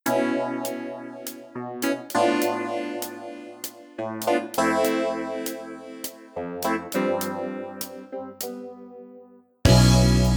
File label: yacht rock vox